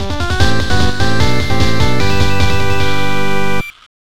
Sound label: Got Item! (Internal Percussion); Cave Story